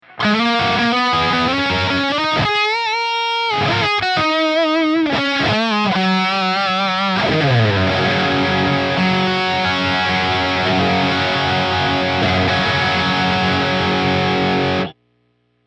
LINE6 epic

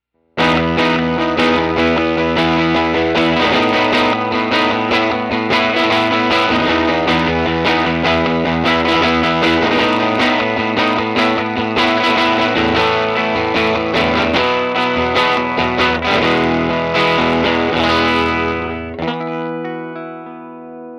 stone age, helix amp model; Helixシリーズのマルチエフェクター

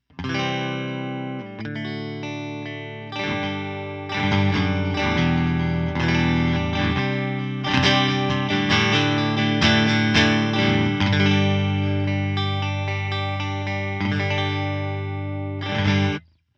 US double nrm, helix amp model; Helixシリーズのマルチエフェクター

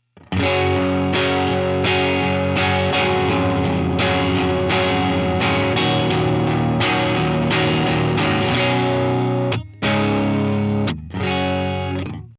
LINE6 litgator

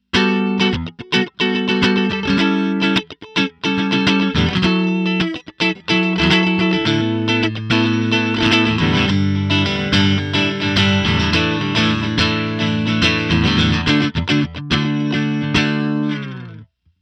jazzrivet; Helixシリーズのマルチエフェクター; helix amp model

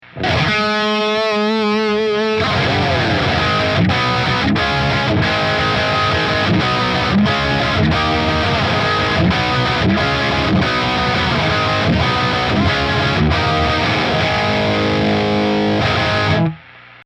LINE6; Helixシリーズのマルチエフェクター

